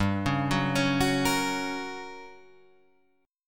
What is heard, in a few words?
G Minor Major 7th Flat 5th, G, down, Guitar, Drop D